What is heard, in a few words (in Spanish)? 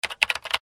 Teclas